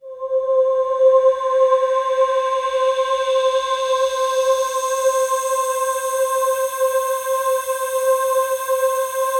PAD, DIGI-PADS

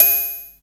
SPACE BONK; Q Up Arts; Dream Experience

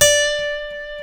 E, funk guitars; Spectrasonics; Hans Zimmer Guitars VOL-1; TAKA FUNK

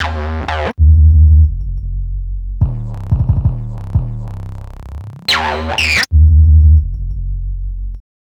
Bad Bass, Drum & Bass; Fluid Dynamics

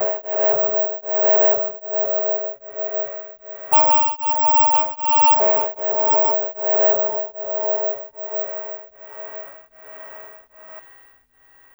Hip Hop R n B; USB Soundscan vol.19; AKAI

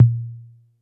Analog Tom; Yamaha PSR-E323; Dance Kit

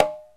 CONGA SET; OMI - Universe of Sounds

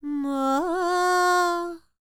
World Voices, INDIA; ILIO; Vocal Planet

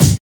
K, Drums; BONUSKIK2; TOUGH GUY

